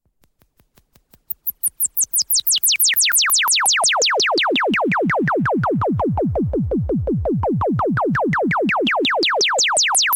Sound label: Jingle